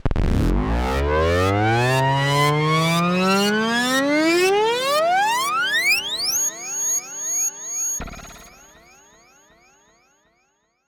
sweep; AIR Vacuum Pro

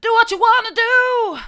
vocal samples; sampleradar

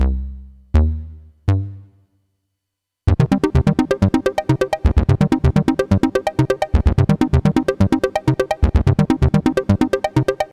casio xw-g1 arp new age

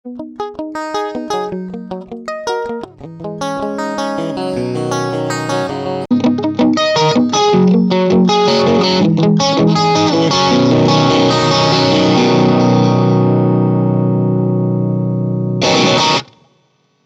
audient id4 mkll test git

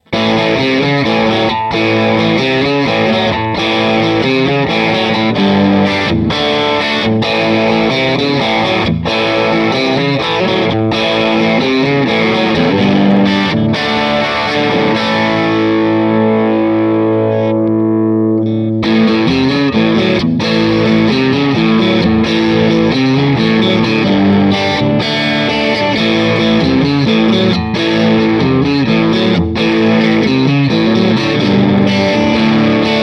crunch

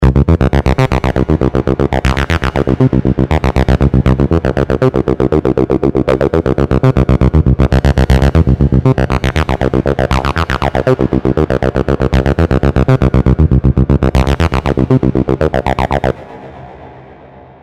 free vst plugin mechaverb shakey

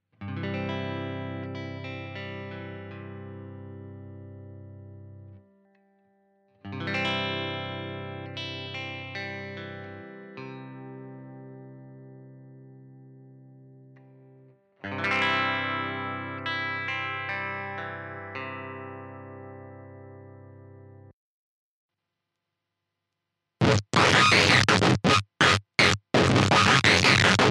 fuzz; rainger fx dr freakenstein

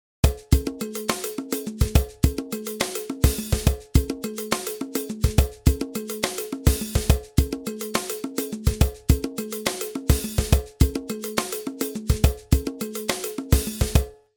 percussion clean; elysia mpressor

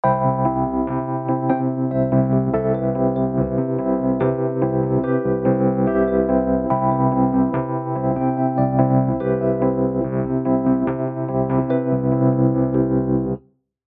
session keys electric s; e-instruments

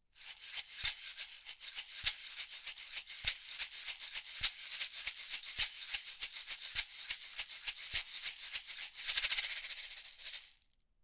Origin Shaker; aston

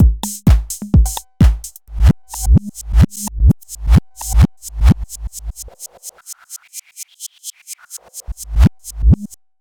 roland tr-8 scatter

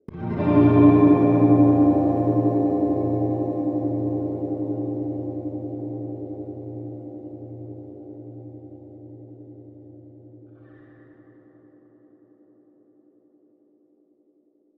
strum wowwow; eventide h9

